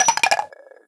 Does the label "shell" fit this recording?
Yes